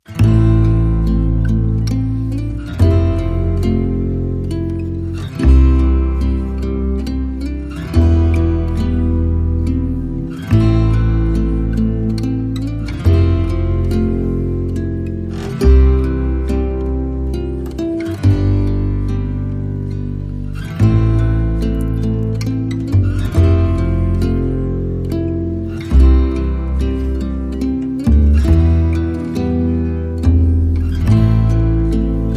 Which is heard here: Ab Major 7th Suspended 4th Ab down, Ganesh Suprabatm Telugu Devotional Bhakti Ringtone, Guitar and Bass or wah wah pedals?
Guitar and Bass